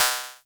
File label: effects, drum machines, FutureRetro FR777